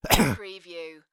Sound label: Male Cough; beanstalk audio